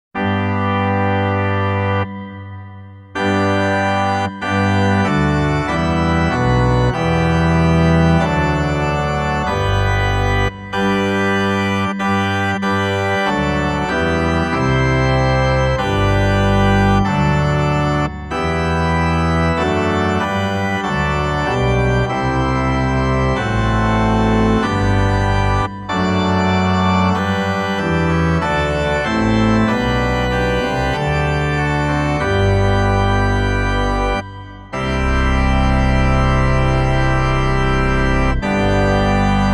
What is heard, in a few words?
Organ Praise God From Whom All Blessings Flow